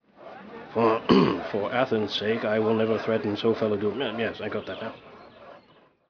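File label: theatre mumble; Ambient; Zeus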